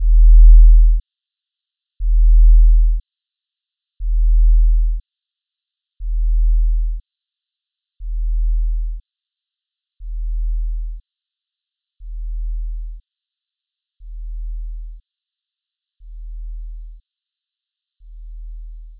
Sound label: 40hz